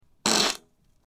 fart in quiet office

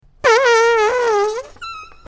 squeaky fart in bathroom

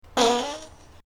long fart in tunnel

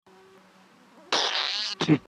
stinky farting contest

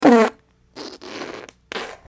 stinky fart in church

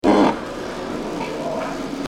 fart at crowded party

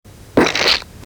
fart on plane sound

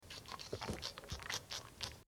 stinky farting dog

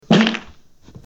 fart in quiet library

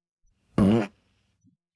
loud farting contest

